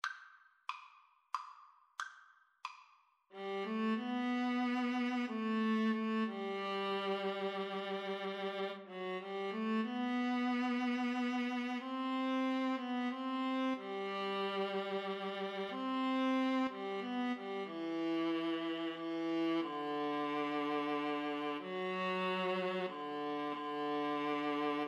viola viola

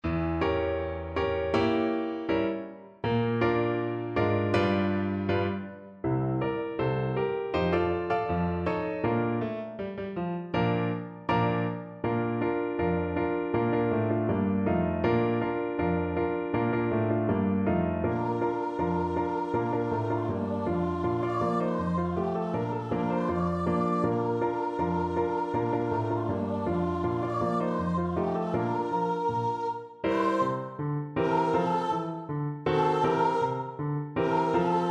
piano

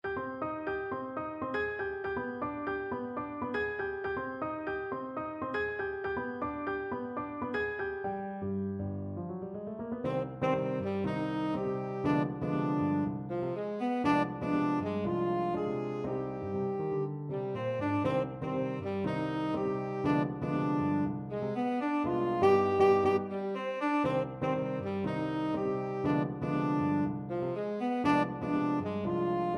tenor saxophone

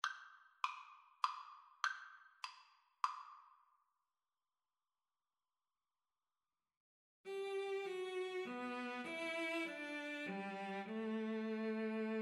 swan, Cello Duet; saint saens

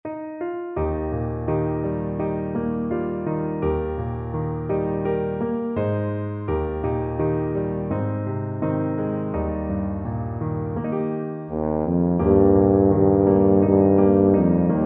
bantry girl, tuba

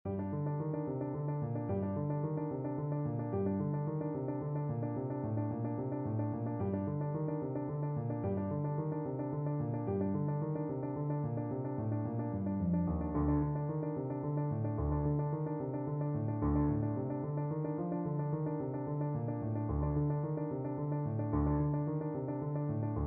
piano

